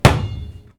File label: Ball Basket